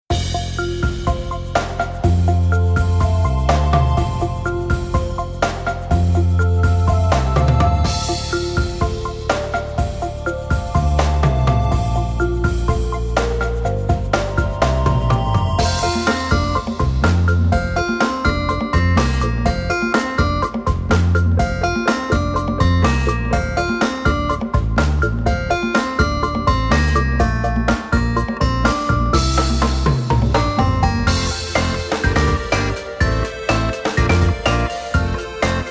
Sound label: Pause Menu; MySims Kingdom